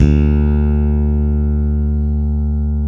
BS PB, P.Bass

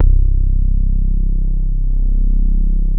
BASSES

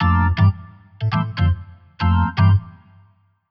Jazz Keys Organ 120 F, Chordy Loops, 120bpm

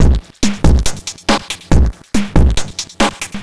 Noise Beat; Drums & Drones

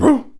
VOX BARK; RAP CITY 1

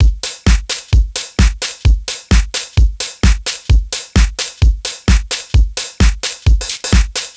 Solid Loop 130, Loungin House; Full Loops 130; Equipped Music

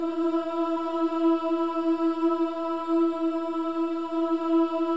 Boys; Spectrasonics; Symphony of Voices

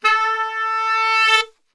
A 2 SAX, Brass Super Section; Best Service

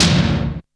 R8 Tom, Roland R-8

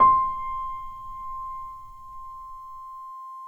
PNO PP; Synclavier Sampler Library

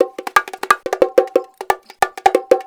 CONGA, ACID JAZZ; Best Service; Advanced Media Trax Modern Composer

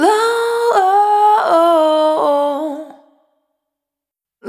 Spin, Single Voice Melodic Loops

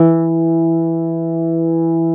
MELO BAS; Synclavier Sampler Library; KEYS AND GUITARS

